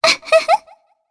Laugh, jp; Shea; kings raid

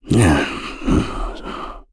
Vox Hum, en; KaselB; kingsraid

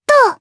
Attack, jp; voices; heroes; Lewsia A; kingsraid

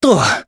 Vox Landing, jp; Evan; kingsraid

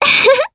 Laugh, jp; Frey; kingsraid

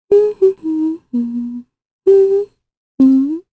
Hum, kr; Talisha; Kings Raid

